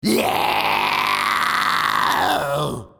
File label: yeeeeeaaaaooooo